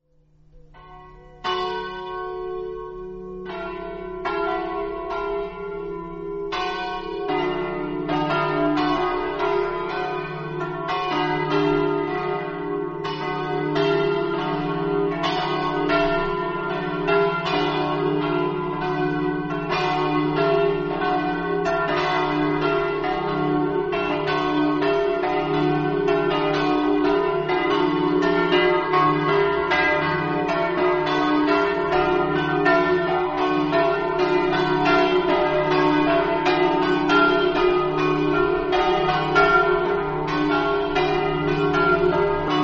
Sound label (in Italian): campane; orsaria